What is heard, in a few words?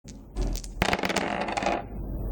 Dice; Yahtzee